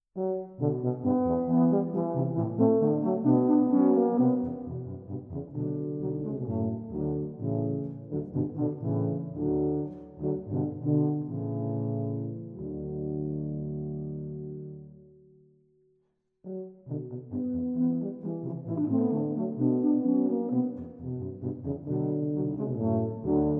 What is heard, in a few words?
Tuba Trio